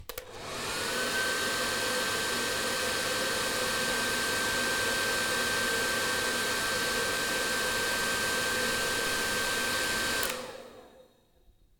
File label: Hair Dryer On And Off